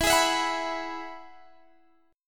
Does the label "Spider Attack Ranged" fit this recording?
No